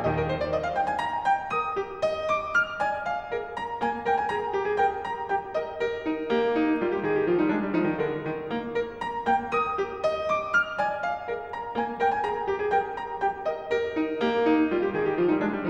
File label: Variations and fugue on a theme for piano in E flat major; Beethoven